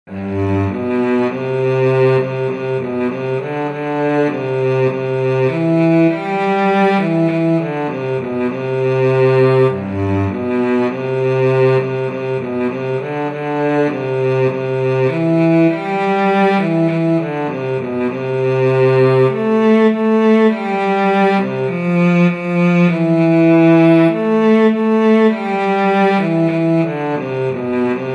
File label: cello; christmas; once in a royal davids city